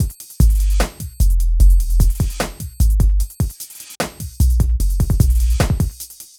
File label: Beat